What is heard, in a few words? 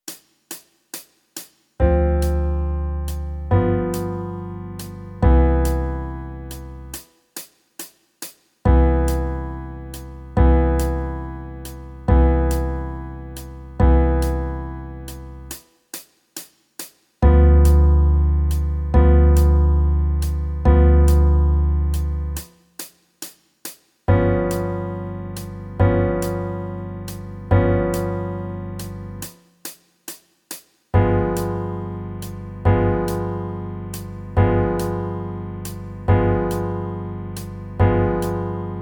Jazz backing; Guitar Techniques 2019 Issue 294 Spring